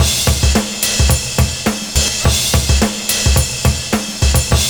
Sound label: loud kicker; Univers Sons